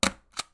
Stamp

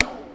Laser

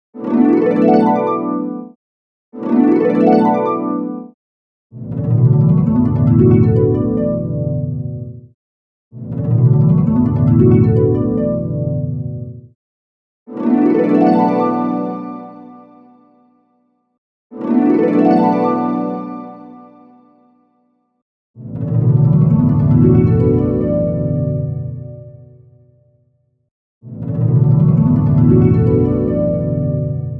Harp Strum; Adriann

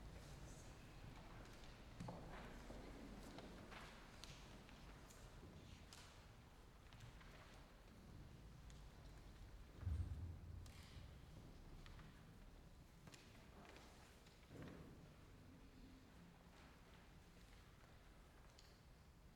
Calm Orchestral Band

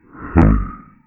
Alien Grunt

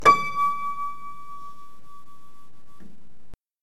Piano

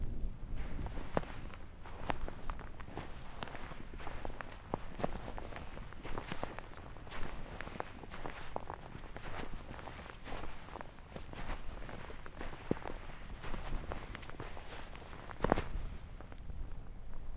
Footsteps In Fresh Snow

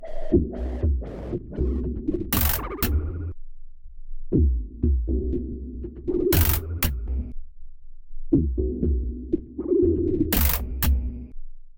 Submarine Beats

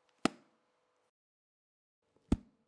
Book Close

